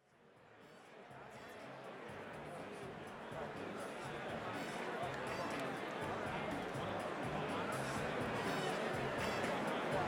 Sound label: Tuning